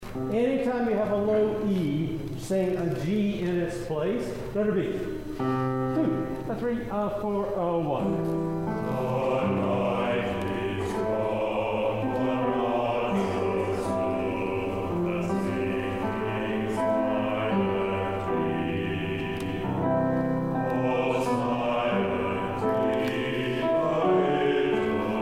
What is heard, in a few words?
bass solo